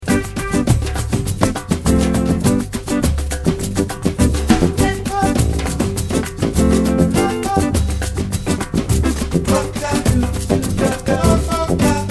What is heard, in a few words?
cat samba